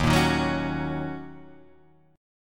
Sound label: D sharp Minor 7th